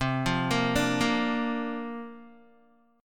C 9th Suspended 4th C down Guitar 4F, 4A, 5C, 5G, 5C, 6E; C9sus4 arpeggiated